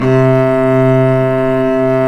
cello, Arco Solo; String Master Series

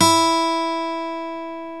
PICK MART

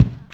KICK, Hip Hop

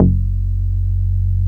GRAND F#1, BASSES

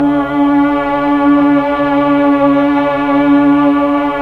PAD, Slow Str+Vox; Roland LCDP09 Keys of the 60s and 70s 1

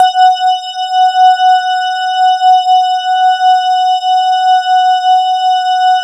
E-HARP, DX HARP; Wizoo - Powered DX

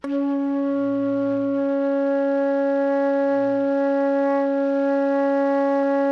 FLUTE FF C#4; Greytsounds; Philip Wolfe David Crozier's Saxophones

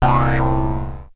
Access Virus B; Synthworx

